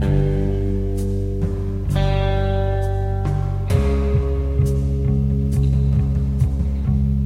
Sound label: guitar, Sad